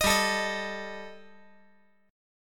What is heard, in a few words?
G Suspended 2nd Sharp 5th G; 5G,4C,5E,5A; chordshape; down